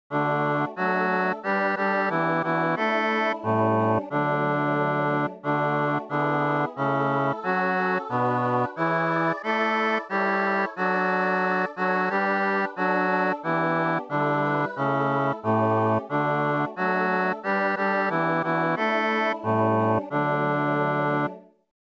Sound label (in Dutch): bas; god zij met ons